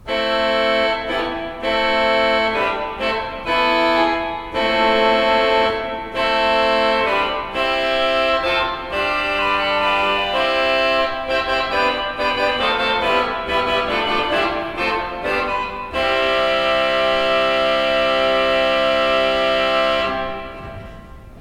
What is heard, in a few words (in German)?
Euler II P, Orgel